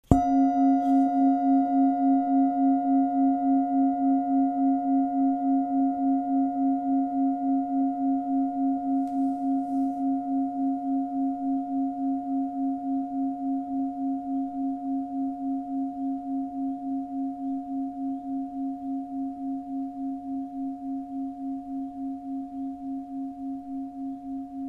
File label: planet bowl; planet bowl erdenjahr